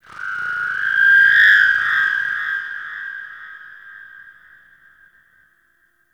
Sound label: Sentient Texture Medium, Ambience; Sentient Lair